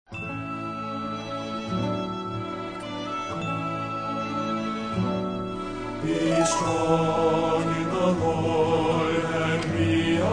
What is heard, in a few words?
Trio